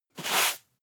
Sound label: broom sweep hard